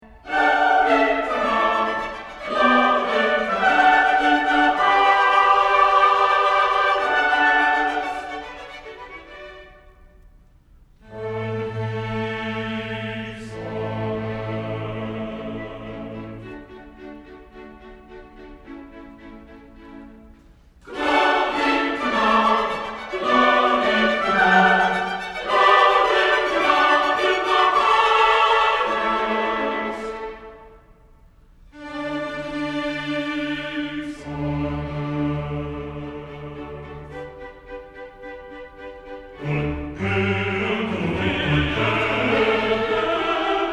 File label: Chorus Glory to God in the Highest; cantate